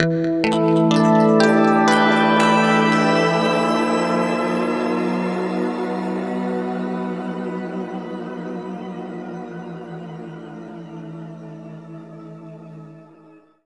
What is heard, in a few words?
harmonic, guitar; East West; Steve Stevens Guitar Samples Collection